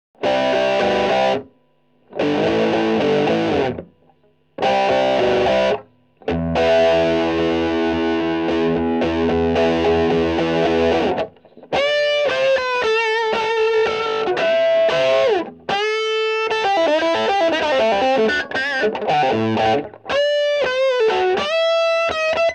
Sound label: Electric guitar - distorted rock sound (mono); Alan Parsons & Stephen Court - Sound Check 1993